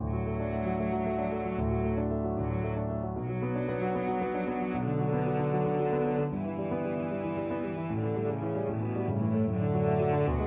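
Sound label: violoncello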